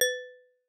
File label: Glass